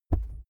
wings flap deep; natur-tiere; voegel

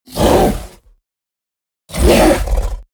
fishman vocal snarl short; kreaturen

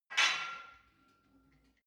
barbell weight rattle, fitnessstudio

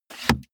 car bmw e46 interior sun visor mirror slide

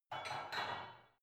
barbell only replace reverberant soft

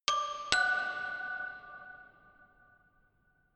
notification glockenspiel creepy third up

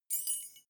key ring mid size fly tinkle; objekte; schluessel

